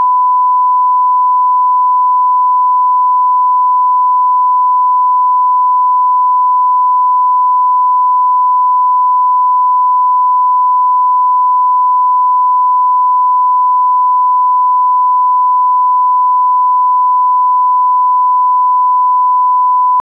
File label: Sine 1000Hz mono; 192kHz; 24bit